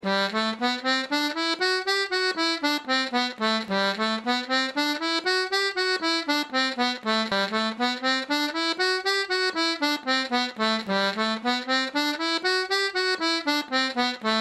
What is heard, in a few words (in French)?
gamme simple sol normal